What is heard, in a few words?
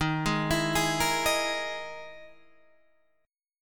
E-Major Sharp 11th Eb